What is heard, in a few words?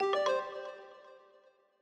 User Account Control; Longhorn Ten Beta Sound Scheme by David Mounder